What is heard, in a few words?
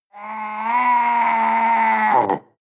panther young vocal cry